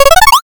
picoquestion

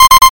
alarm

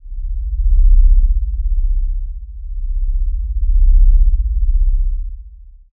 drone

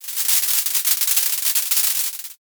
ovation

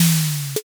drawer

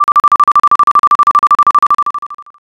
trainbell

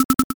locked door

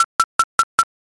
calculator

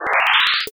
hikido

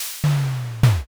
fridge

